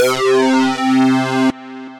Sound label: Sync, Synth Lead; Traffic Sync; Ableton; Factory Packs; Synth Essentials